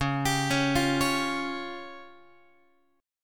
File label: C sharp Major Flat 5th, Guitar; C#Mb5 arpeggiated; JGuitar